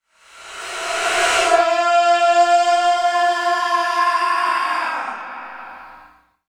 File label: Vox FX